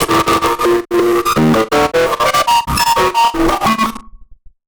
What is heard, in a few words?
Casio, Glitched, malfunctioning synth